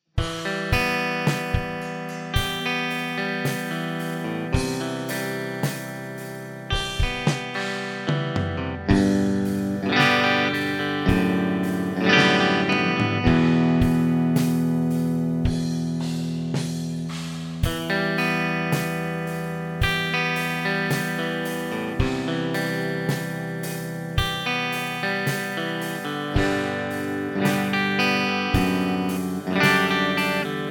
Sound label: CLEAN, guitars